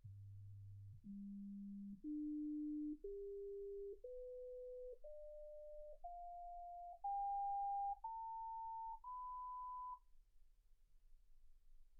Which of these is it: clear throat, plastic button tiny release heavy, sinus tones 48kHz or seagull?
sinus tones 48kHz